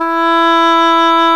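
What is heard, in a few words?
English horn; Sound & Vision - Gigapack I CD 2 (Roland); Brass&Woods1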